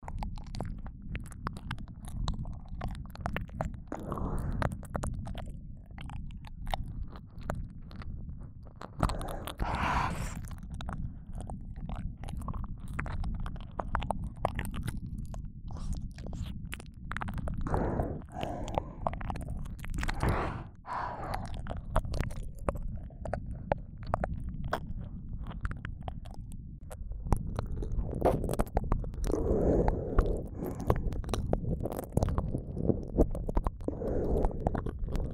右耳舐めループ; シアの右耳舐めループ; ASMR

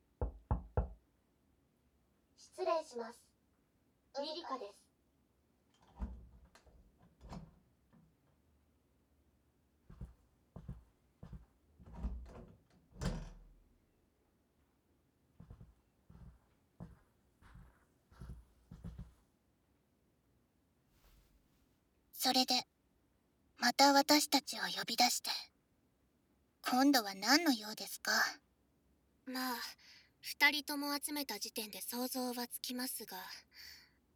両耳舐め・ダブルフェラ

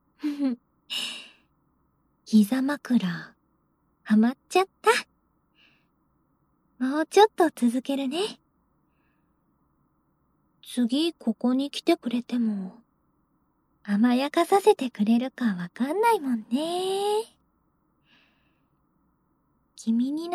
ゆるっとヘッドマッサージ, ASMR; このまま……寝かしつけたくなっちゃう…

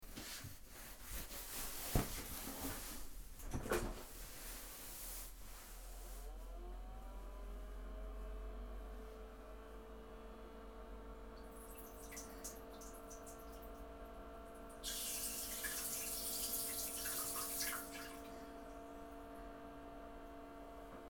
普通のおしっこ音

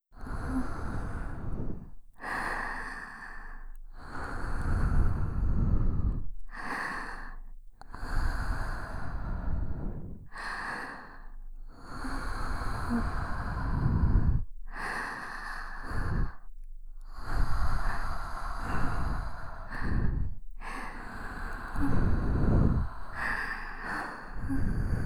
お耳吐息

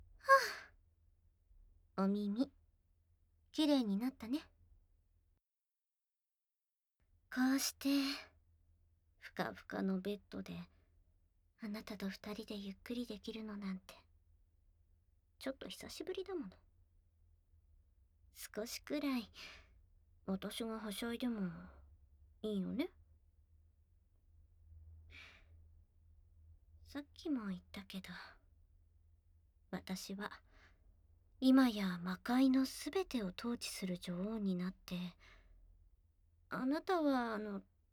あまあま耳舐め♪; 異世界魔王; 異世界魔王といちゃらぶ子づくり; バイノーラル

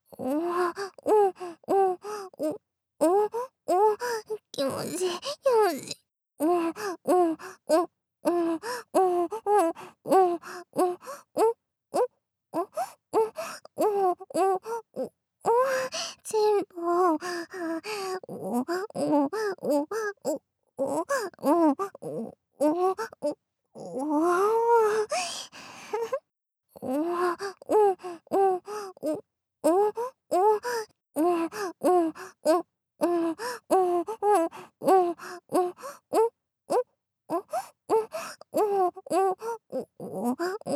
オットセイ鳴きループ

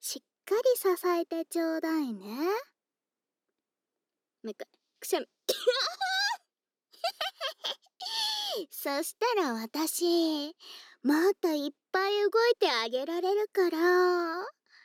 録音NG（くしゃみ）; 声のみ差分

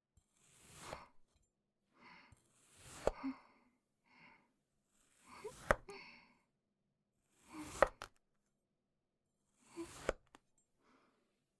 砂粘土を切る音ループ版

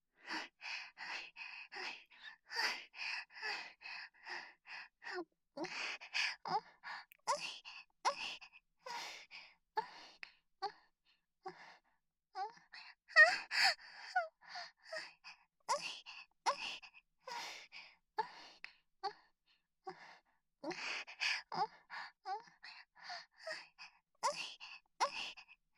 喘ぎ声リピートボイス; 桃花ちゃん

